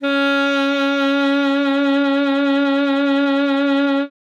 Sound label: sax c#4, Saxophone mezzoforte